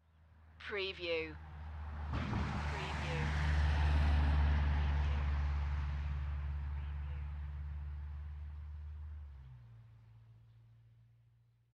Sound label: Vehicle driving over cattle grid; beanstalk audio